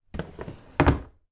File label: household wooden sideboard drawer close